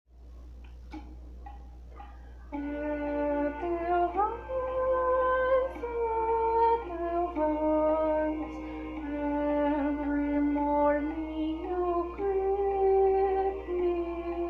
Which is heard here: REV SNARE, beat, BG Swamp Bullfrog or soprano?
soprano